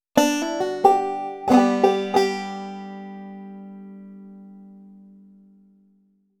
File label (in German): triole durch hammer on